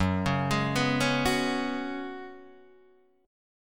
F sharp Suspended 2nd Suspended 4th, Guitar DADGAD